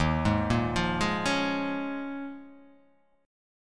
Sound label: D sharp 7th Suspended 2nd Suspended 4th